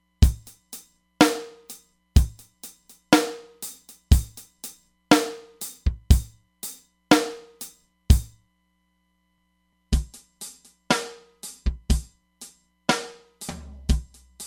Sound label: Metallic Tank, Roland DEP-5; Factory Patches